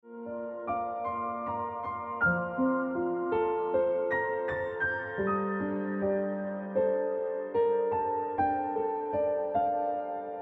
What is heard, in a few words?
Ringtone Piano Music